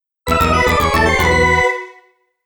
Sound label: Player Miss - Midi Roland SC-55 Version